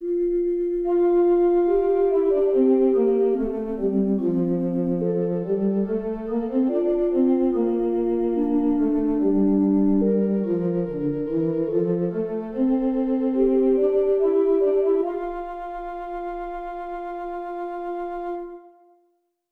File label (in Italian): Esercizi di contrappunto a due voci